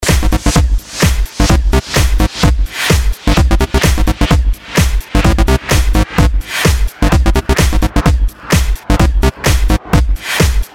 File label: techno